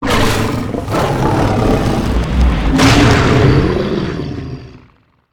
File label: death, monster; templer angel; lake